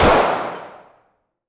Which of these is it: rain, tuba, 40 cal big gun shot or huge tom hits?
40 cal big gun shot